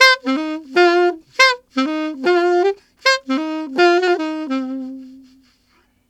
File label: Alto Shuffle in C min, Alto Sax; Sonic Foundry (Sony Creative Software)